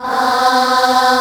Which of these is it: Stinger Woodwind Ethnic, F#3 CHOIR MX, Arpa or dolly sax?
F#3 CHOIR MX